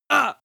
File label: male groan pain short soft; menschen; schreie